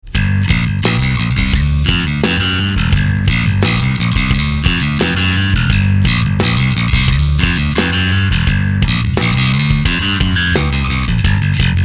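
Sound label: Rock, Bass Driver D.I.; sansamp; Musicians' Friend Rock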